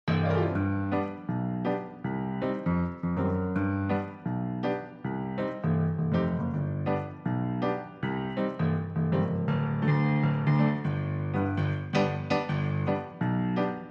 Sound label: Piano